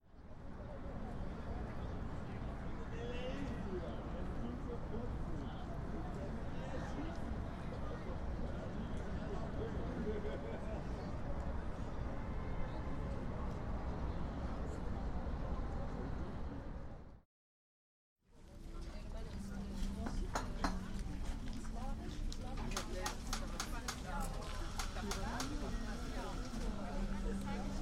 Berlin Ambiances; Loot Audio; Hzandbits; Berlin Ambiances II

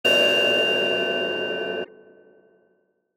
Feedback; Blastwave FX

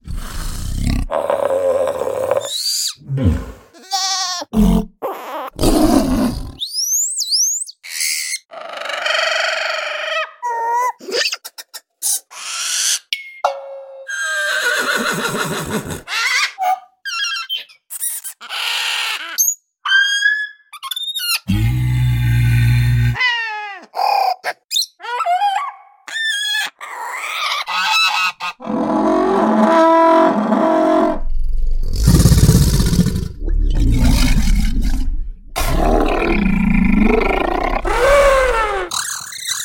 Animal Hyperrealism

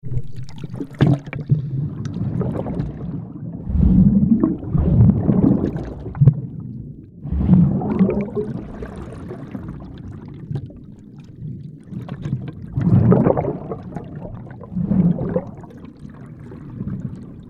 Water Underwater

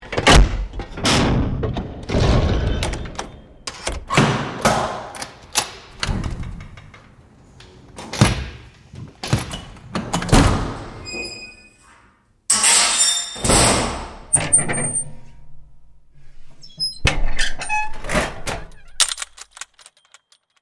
Metal Doors; Big Room Sound

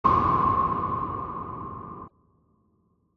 Horror Film FX; Field and Foley; Horror Film FX Vol 2

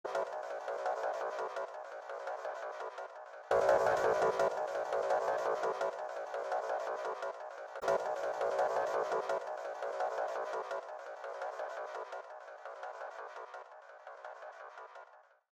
Musical Elements; Big Room Sound

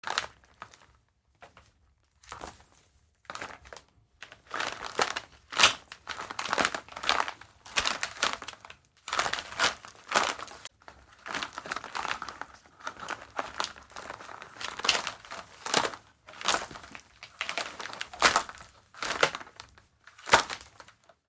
Metal; Big Room Sound Essential Metal

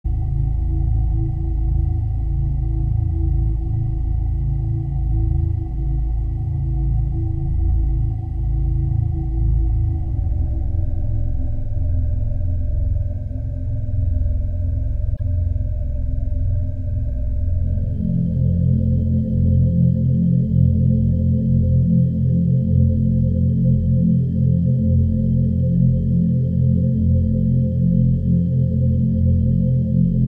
Dark Drones; The Producers Library

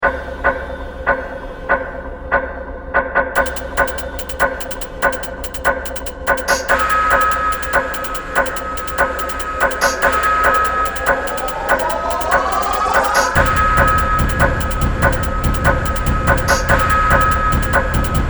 Sci-Fi and Cinematic FX; Field and Foley

